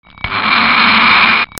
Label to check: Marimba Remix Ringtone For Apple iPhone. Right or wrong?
Wrong